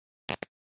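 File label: Cloth Split Rip Tear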